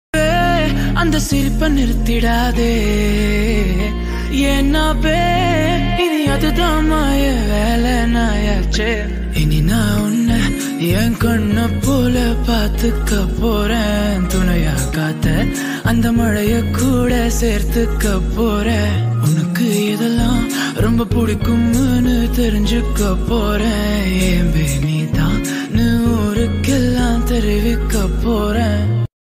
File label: tamil ringtone; don movie